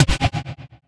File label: Trap Oneshot; Sonic Foundry (Sony Creative Software); Esoterik Beatz